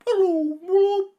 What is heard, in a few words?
Hello bird; SyrinScape; Syrinscape unplugged; Jungleplanet dinolaser battle